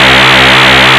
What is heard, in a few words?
lead; Mono